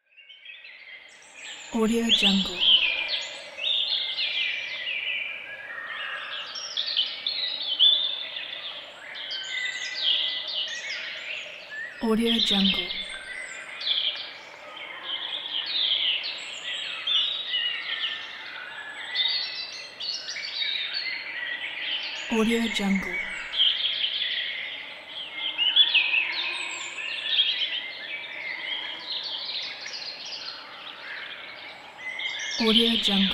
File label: early morning forest